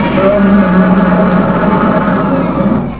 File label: Dfly roar